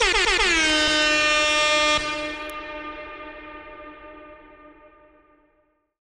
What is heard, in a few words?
air horn designer; soundscrate